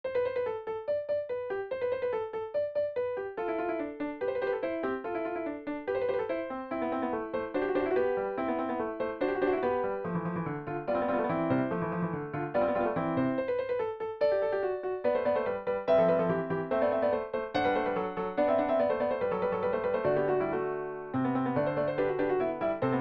Fugertes vierstimmiges Stuck in C Dur; Albert Willem Holsbergen

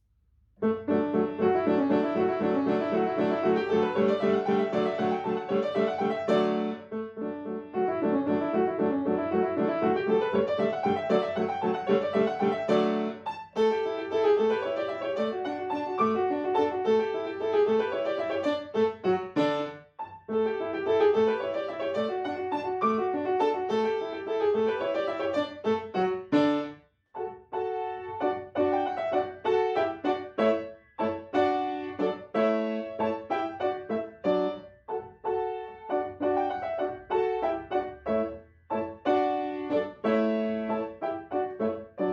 Deutsche Tanze fur orchester Klavierubertragung

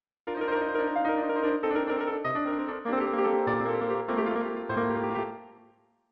Kadenz; Unbekannte Kadenz zum ersten Fassung des B Dur Konzertes

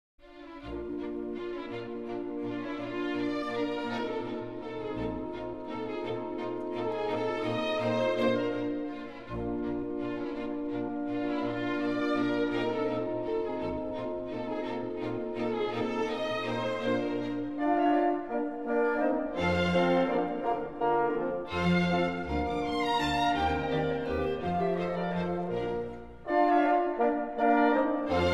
Walzer; Elf Modlinger Tanze

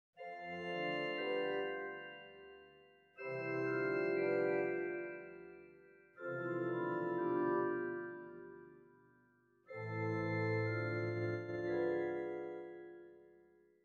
Aufhaltungen des Terzquartsextenaccordes

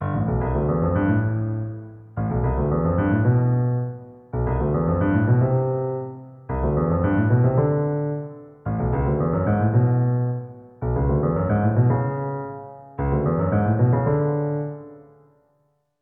linke Hand

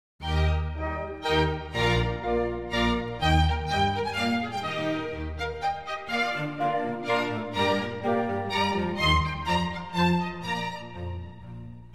Modlinger Tanze Menuet

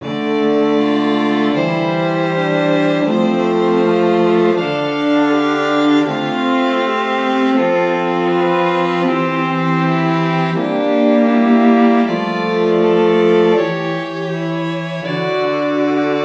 Stimmiger Contrapunkt; Ronge; corretto

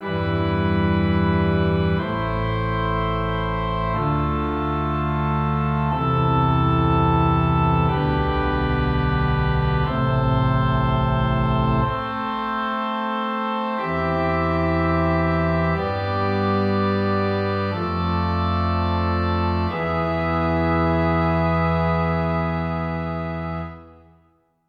Stimmiger Contrapunkt; Ronge

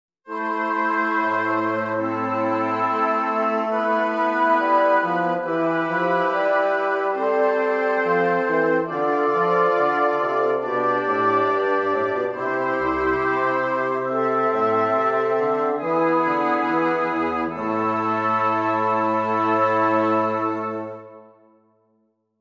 Stimmiger Contrapunkt; 5 Gattung; Ronge

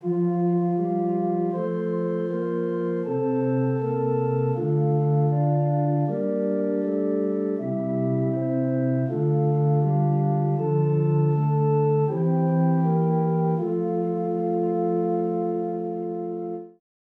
Zwei simmiger Contrapunkt; Ronge; Seconda versione dell esercizio

